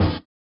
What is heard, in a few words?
SWUSH; Spectrasonics; Metamorphosis